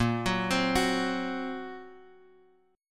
A sharp Suspended 2nd Sharp 5th, down, Guitar, Open F; arpeggiated; JGuitar